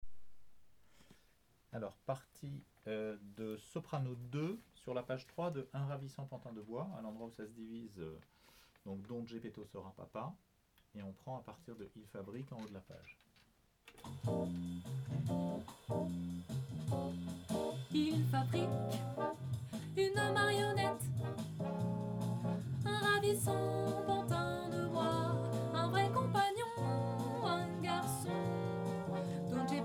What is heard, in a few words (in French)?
Sop 2; Pinocchio